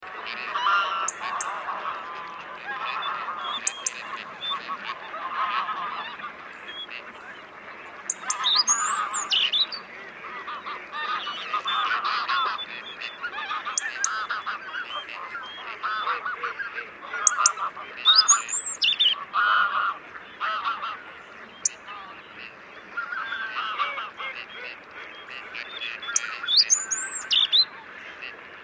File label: Erithacus rubecula; SETE